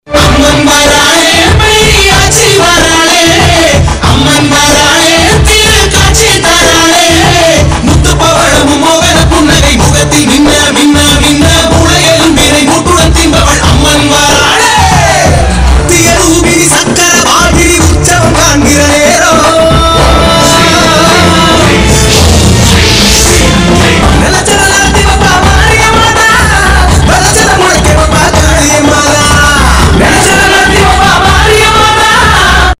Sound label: tamil ringtone bgm ringtone